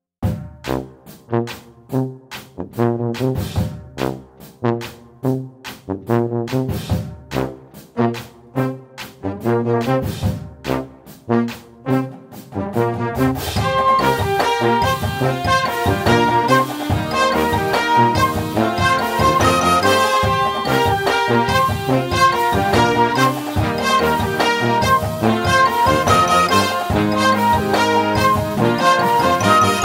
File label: Hip Hop Stadium Blasters, Marching Band